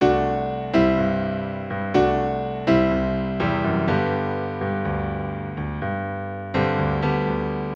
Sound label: Source Piano